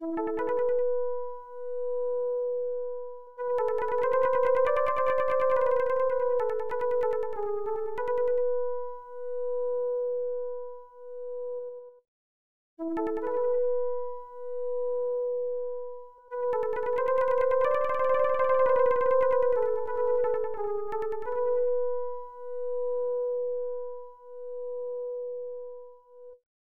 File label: Flute; Mid Garts; X68000